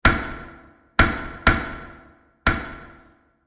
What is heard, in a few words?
Hammer In The Hall; Hit Sound Effects; horror